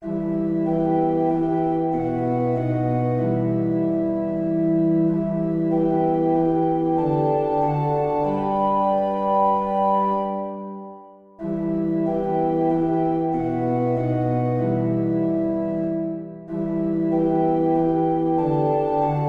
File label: Organ, hymn; Lord Jesus think on me; St Pauls; Hymnal 1982